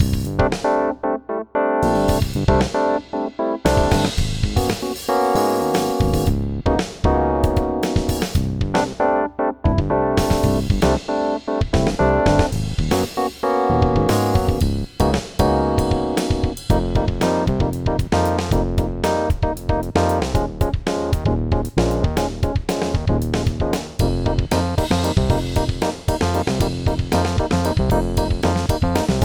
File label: funk